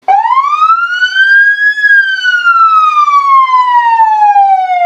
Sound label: Sirena